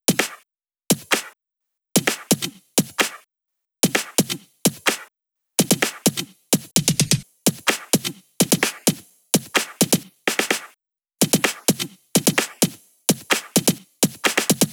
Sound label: Loop 128BPM Perc Loop; VEC5 Drumloops; VEC5 Multi Loops 128BPM; Loop Elements